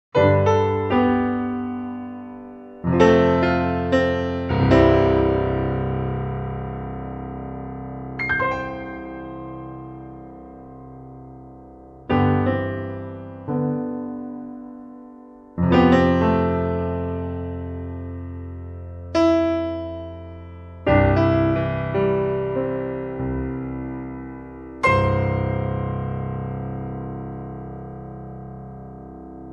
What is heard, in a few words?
Vintage Steinway D, piano